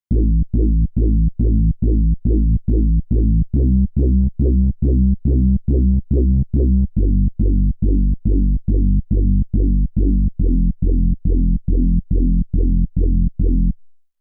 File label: HARD BASS 140 A, Hard Trance, Bass